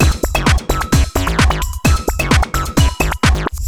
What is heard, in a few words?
Song Funky House 130 Bpm; dunk lords; Minibot